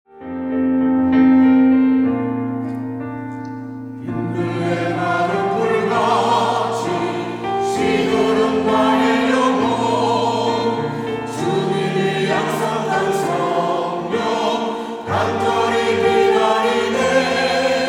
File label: choir